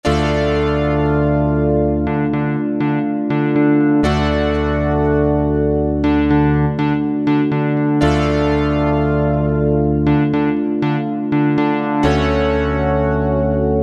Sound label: BIG SYNTH; Akai SG01v